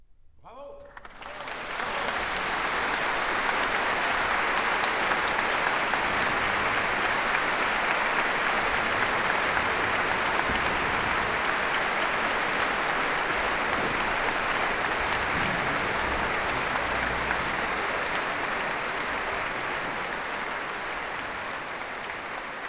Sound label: Applause; Don Juan; Strauss; Eine Alpensinfonie